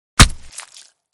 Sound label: axe horror sound effect